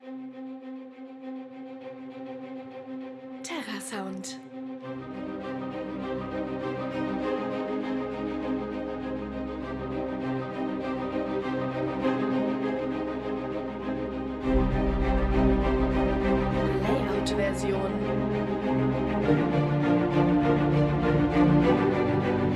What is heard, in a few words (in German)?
ohne chor; terrasound